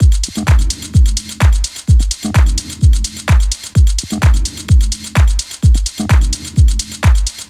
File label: Beat Loop 128bpm